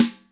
Snares Soft

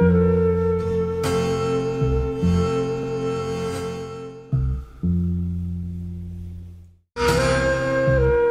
flute, Jazzmatazz Loops

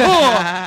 huh male, Voice

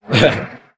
heh, Voice; ooh ahh

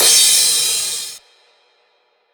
whiplash, cymbals

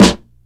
loudest, snares real

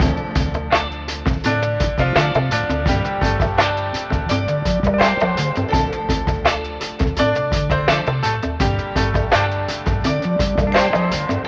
full telephone song, Phonky Loops

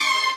squeal, synths; Notes

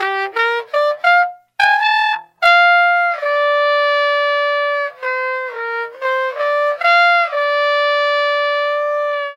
horns, Loops Phonky

